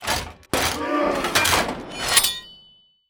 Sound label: barracks complete; 0AD